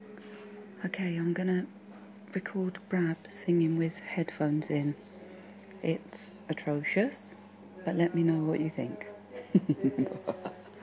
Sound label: lovely singing with headphones on